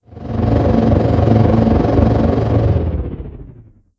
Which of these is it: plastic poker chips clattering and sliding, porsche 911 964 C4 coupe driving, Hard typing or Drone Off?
Drone Off